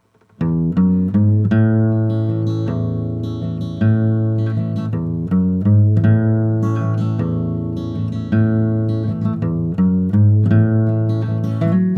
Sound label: Engineer Gtr; Team Fortress 2 Source Filmmaker leak music